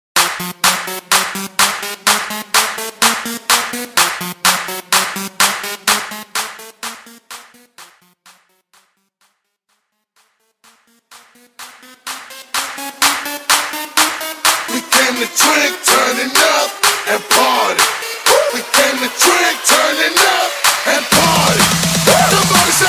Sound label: EDM Mainstream Clap A Pella Starter; Mainstream VIP; Vegas Edit; 126 Bpm